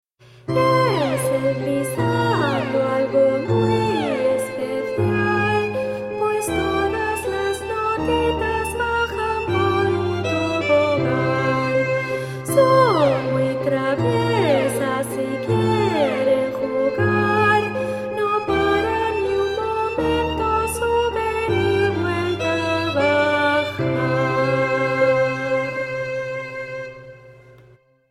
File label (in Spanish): glisando voz